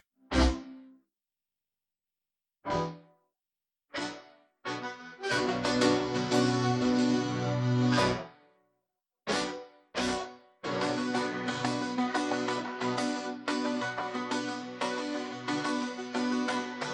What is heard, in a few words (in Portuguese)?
(Versão Piseiro) (Synth)